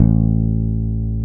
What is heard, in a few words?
Basses, Roland